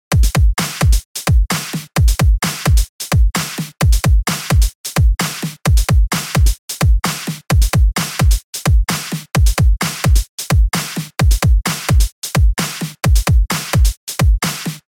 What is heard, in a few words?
First Beats